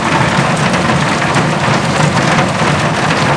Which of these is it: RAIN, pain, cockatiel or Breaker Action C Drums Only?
RAIN